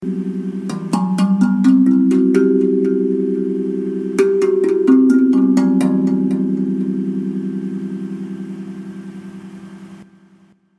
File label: hapi drum akebono e scale with lunar bounce filter